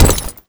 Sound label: foley impact